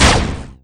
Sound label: shields hit; garrysmod